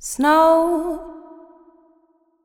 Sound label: Snow, female vocals; Advent Calendar 2017 Day 1